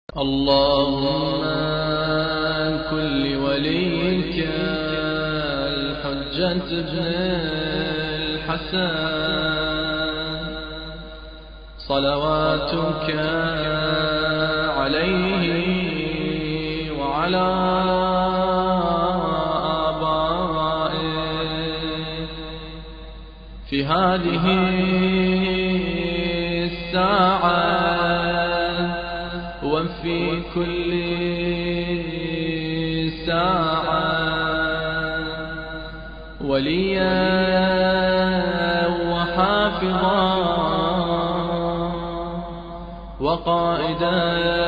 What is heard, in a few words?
Arabic